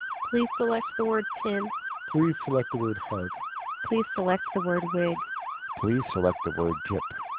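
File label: AMR narrowband siren; Codec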